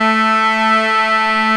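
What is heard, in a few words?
DUAL TENOR, Bagpipes; E-MU Formula 4000 Series Vol. 4; Earth Tones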